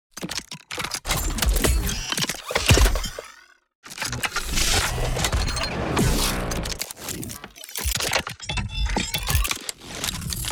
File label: Cybernetic transformers robot transformation